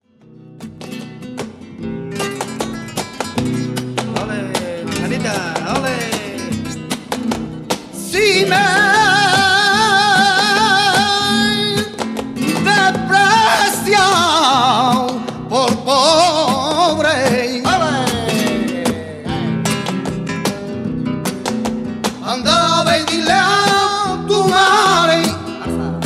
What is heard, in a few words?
tango